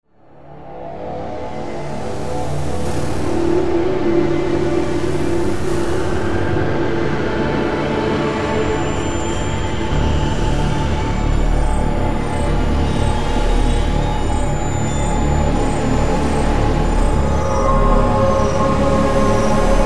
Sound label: ambient spooky